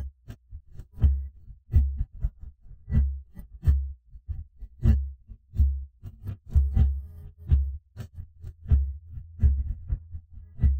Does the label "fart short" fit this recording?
No